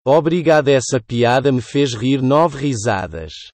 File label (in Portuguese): risadas